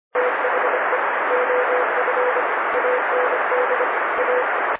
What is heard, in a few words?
noise